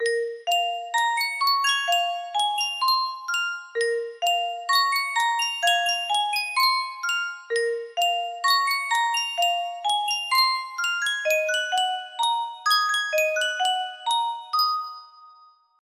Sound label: yunsheng custom tune music box